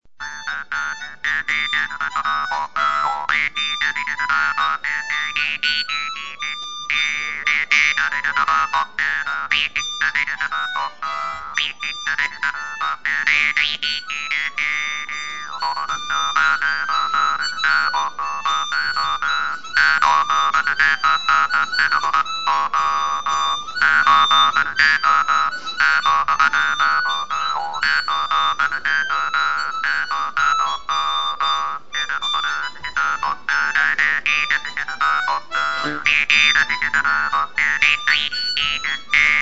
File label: Irish Duet, jews harp